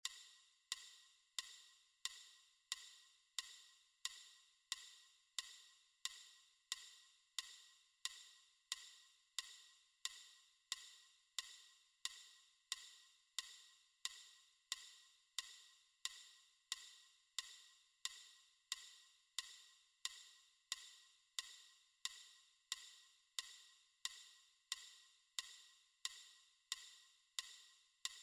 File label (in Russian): метроном 90